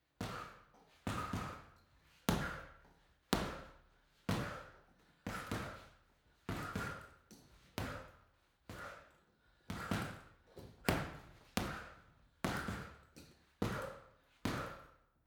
boxing punching bag boxing sequence female breath reverberant; boxen